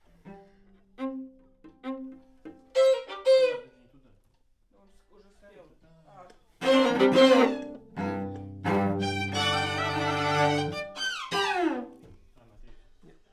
Alt, piano

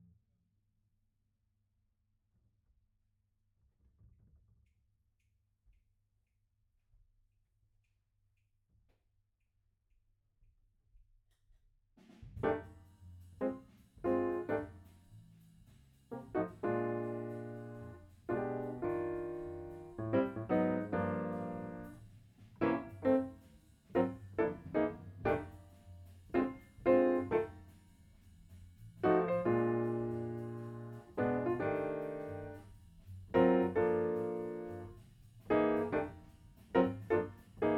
Piano B

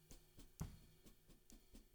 SNR Top

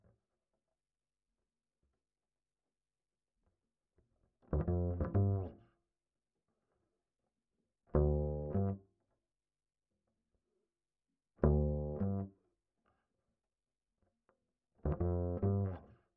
Bass Line

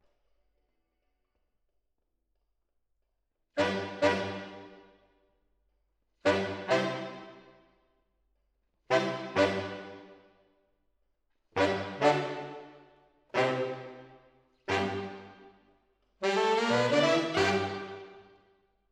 MKH AB, piano

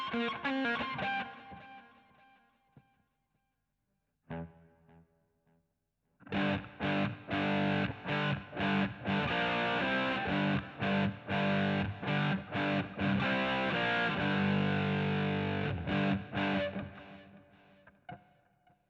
GTR

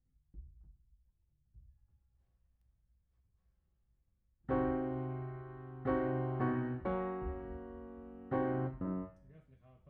Piano Mono

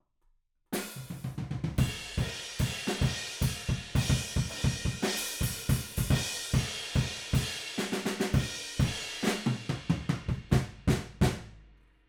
Room

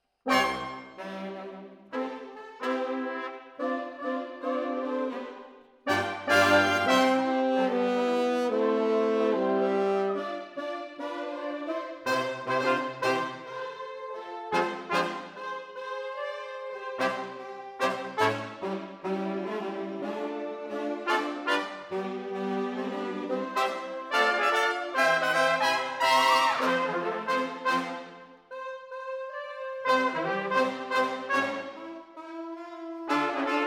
Horns Over

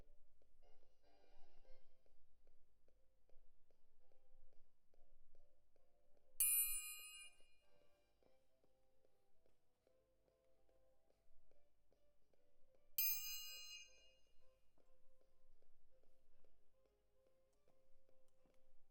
Perc Close

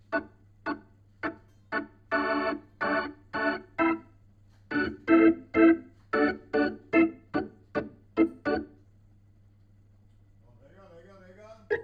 Hammond Leslie

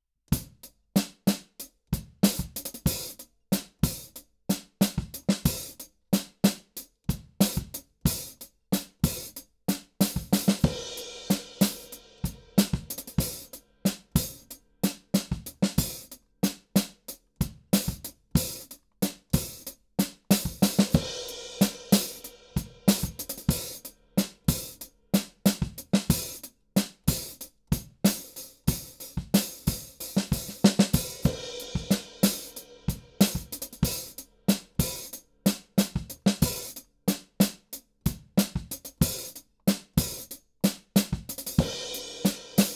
KIT

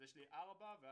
Ride

